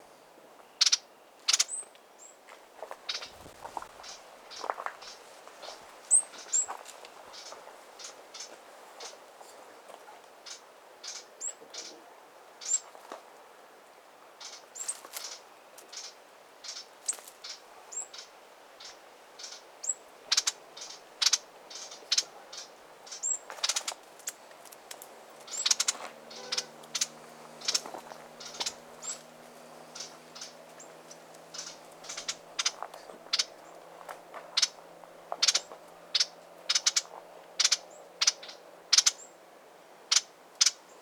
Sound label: Peruvian wren; پرنده الیکایی پرو